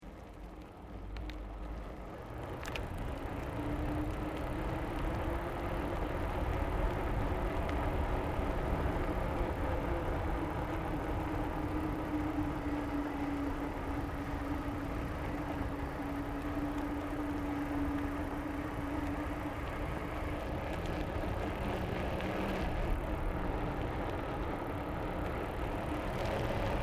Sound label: Bicycle Mountain Bike Road Freewheeling Downhill Long Stops; Bike near Tazawako Japan; Bicycles